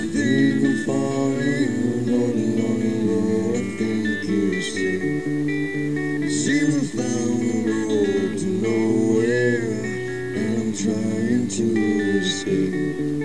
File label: onelastbreath